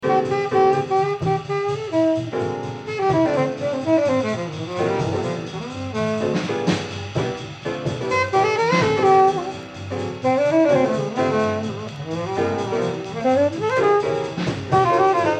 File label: battle of the saxes solo